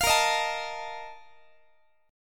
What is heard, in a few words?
A sharp Major 7th Suspended 4th, Guitar; A#M7sus4; strummed; x,x,20,20,19,20